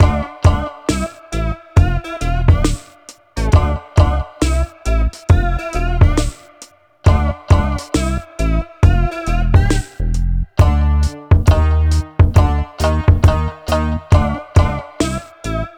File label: New Bpm68 L L